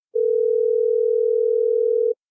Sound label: basic tune; ringtones